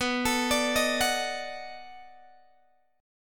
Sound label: B 7th Sharp 9th, down, Guitar, Open G